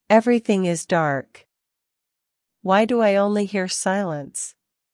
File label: breathing; voiceover; intro; nova farma